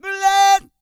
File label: SING, World Voices, CARIBBEAN; Spectrasonics Vocal Planet CD5